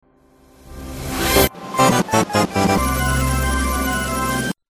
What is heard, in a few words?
oh well music; reverse